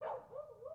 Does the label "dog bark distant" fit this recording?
Yes